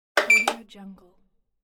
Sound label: exercise bike; alexcristoforetti100